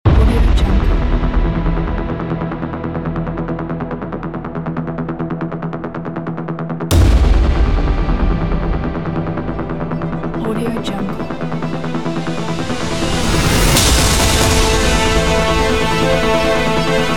epic heroic trailer ident

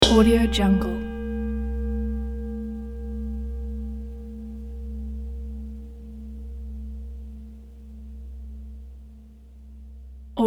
wok hit

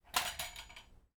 crossbow set down slide on linoleum floor

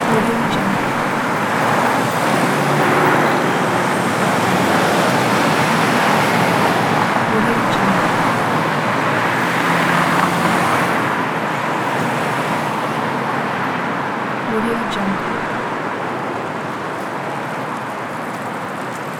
highway

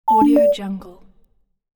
button application accept

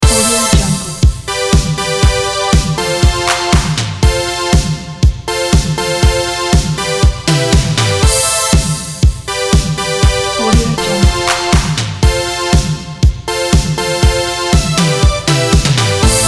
energetic 80s pop

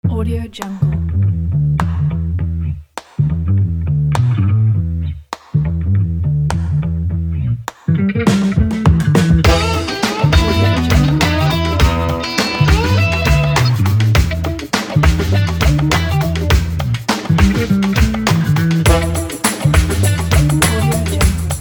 in the funk groove

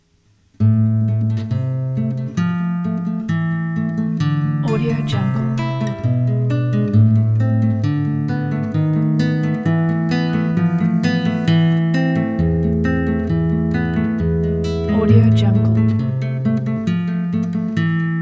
etude a minor

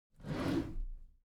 object drawer packed smooth open soft halfway; smartsoundfx

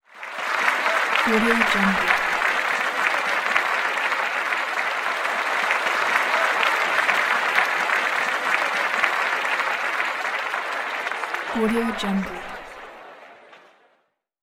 crowd applause

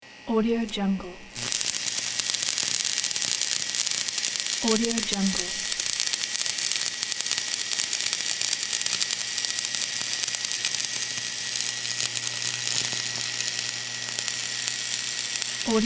welder

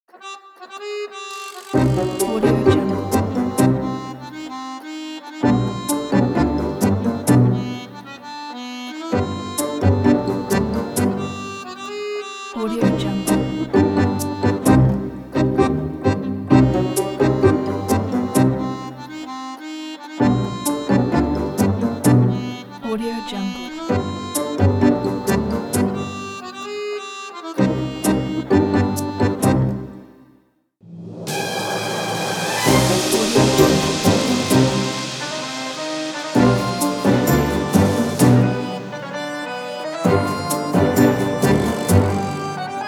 tango kit; eliansproductions

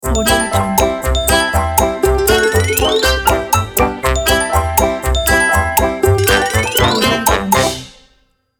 comedy logo

